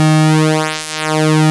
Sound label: PULSE MOD; E-MU Formula 4000 Series Vol. 3; Analog Odyssey